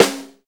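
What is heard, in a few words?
low snare a key, tuned to the A note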